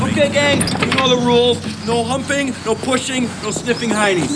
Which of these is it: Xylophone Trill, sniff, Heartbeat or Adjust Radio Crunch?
sniff